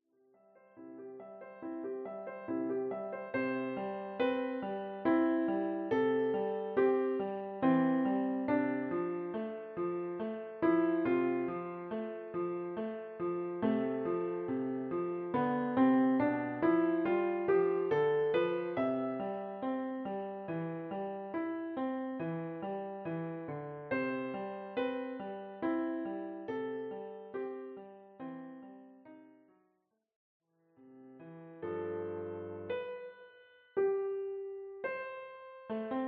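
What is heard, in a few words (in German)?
Der Schwan, Klavier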